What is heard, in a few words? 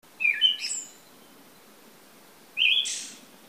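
mamijiro, Siberian thrush; bird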